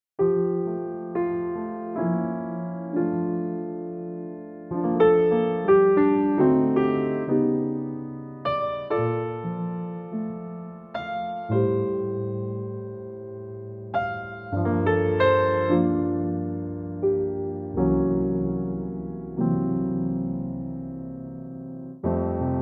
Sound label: F piano